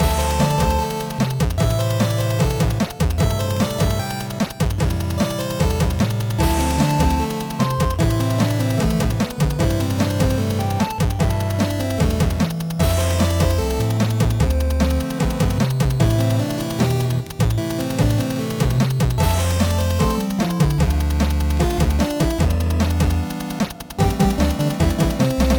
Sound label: Chiptuney